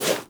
Wall Jump Foley; Footsteps; WallRun; Warframe; Characters; Tenno

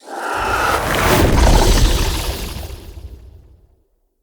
Polymorph Spider Cast; Enemy; Abilities; Characters; Mendicant; Mnd Wazard

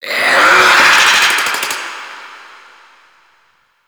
Infested Scare Vocal; Warframe; Corpus Infested

